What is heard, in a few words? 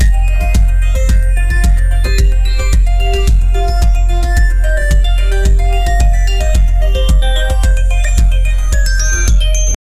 golden award music, awards